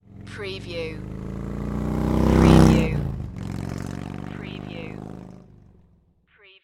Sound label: Motorcycle Harley Davidson Drive By Right To Left